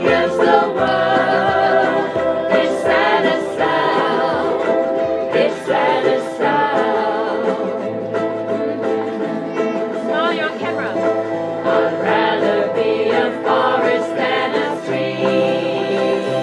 ukulele

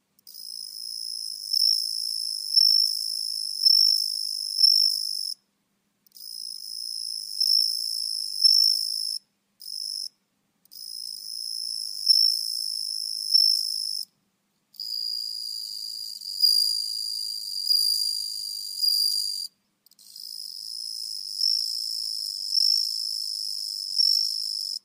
crickets; ieki shin

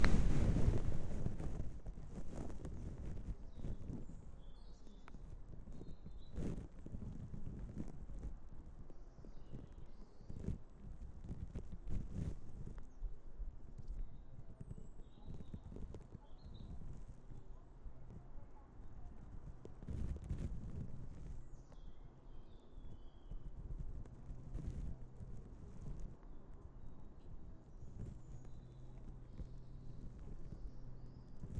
easter monday birdsong, listen for wing flaps; anyone know what bird near start is; wing flaps at end

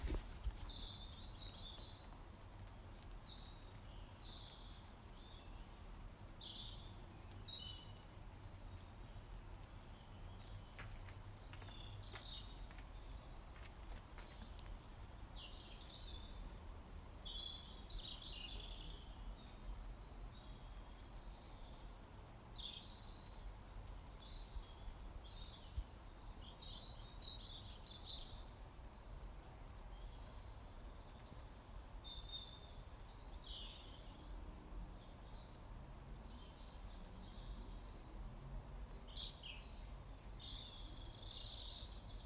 soft evening rain and beautiful birdsong

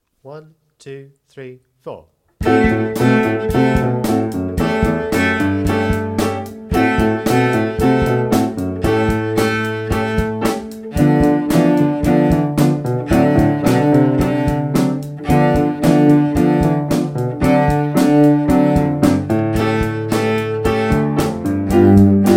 lift off cello

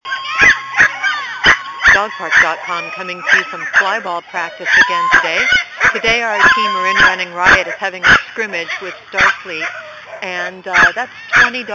flyball practice

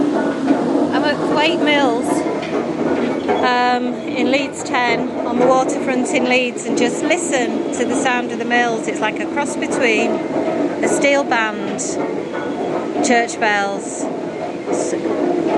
music made by the water wheel at thwaites mill

